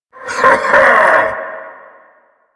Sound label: Heavy Laugh; Warframe; Dax